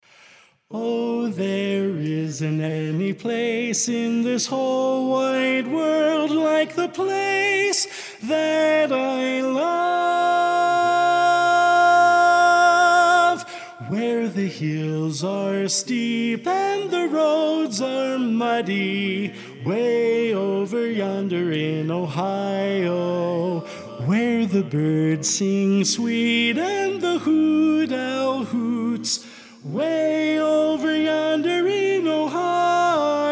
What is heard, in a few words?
Lead